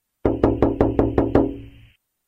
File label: knock SMS